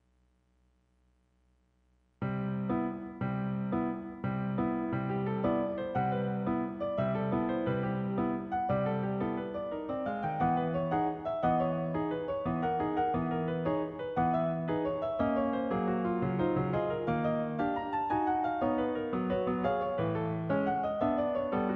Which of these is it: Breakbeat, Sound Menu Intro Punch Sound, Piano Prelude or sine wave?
Piano Prelude